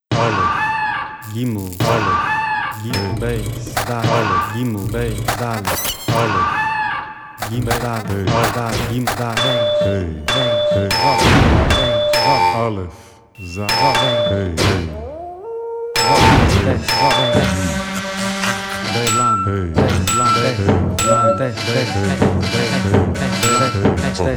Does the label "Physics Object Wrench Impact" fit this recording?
No